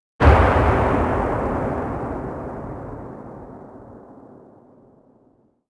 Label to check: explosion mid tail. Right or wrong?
Right